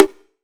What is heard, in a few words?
Perc; Sample Banks; Percussion